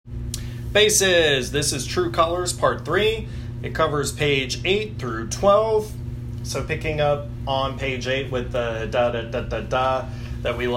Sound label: Bass